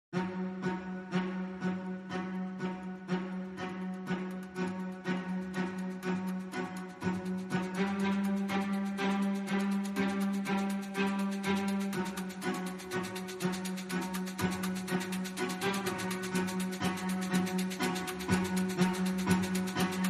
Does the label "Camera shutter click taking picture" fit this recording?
No